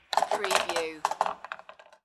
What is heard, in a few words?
Billiard, Pool, Snooker - Two balls fall in pocket